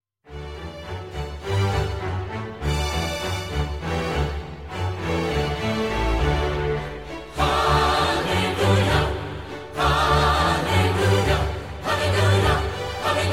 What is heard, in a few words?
Hallelujah Chorus